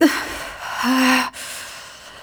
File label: Fight Breath; Cinematics; Warframe; TheNewWar; Throne Room